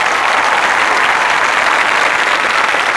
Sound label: clap; WhiteOut; XBOX; breaker